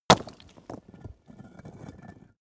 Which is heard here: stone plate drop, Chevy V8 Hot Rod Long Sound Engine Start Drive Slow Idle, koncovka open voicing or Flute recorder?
stone plate drop